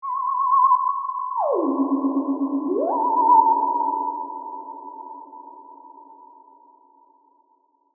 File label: Ghost Hover, Vintage, Synth; Cartoon; Cartoon Sound Design; BlastWave